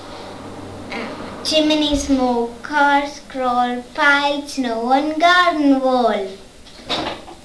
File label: Chimney